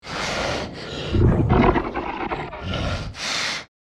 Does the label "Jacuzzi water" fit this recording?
No